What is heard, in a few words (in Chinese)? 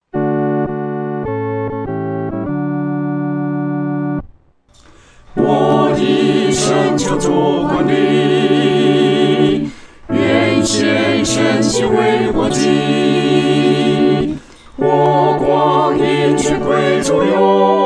合唱 四声部